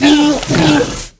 hit, creatures; Star Wars Obi-Wan; XBOX

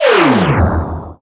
Prop undocking; Star Wars Clone Wars; XBOX; Xbox Live Effects

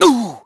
damage; Pulse Racer

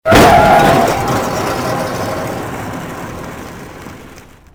hit scrape; Soldier Of Fortune II Double Helix; XBOX; ambience

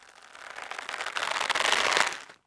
Rope Creak, ambience; Star Wars Obi Wan; XBOX

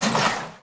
suspen, Vehicle

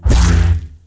saber death

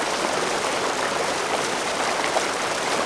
water lap; Star Wars Knights Of The Old Republic II; XBOX

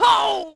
fall; Splashdown; XBOX

